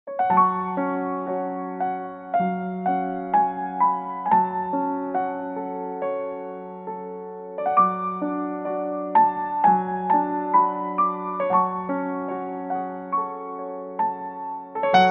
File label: Fm, violin